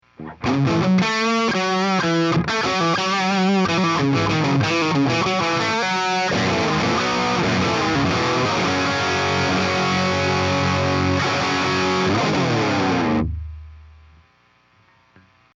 brit 2204, helix amp model; Helixシリーズのマルチエフェクター

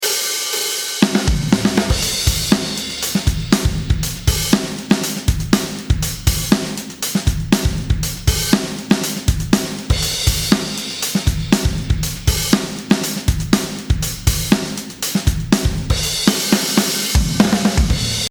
HX STOMP drum

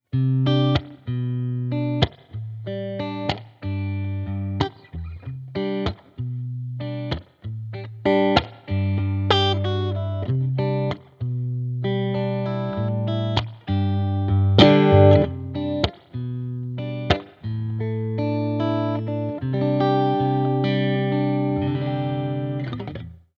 warm finger; Helixシリーズのマルチエフェクター

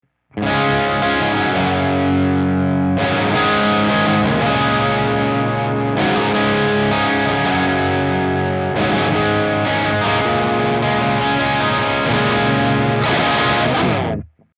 pv vitriol clunch; Helixシリーズのマルチエフェクター; helix amp model

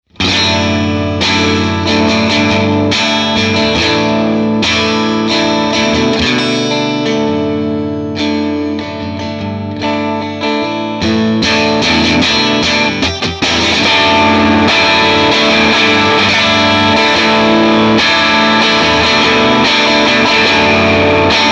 CRYSTAL BACKING

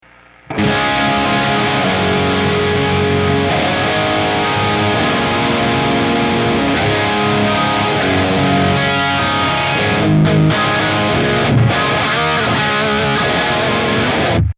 vitriol lead, helix amp model; Helixシリーズのマルチエフェクター

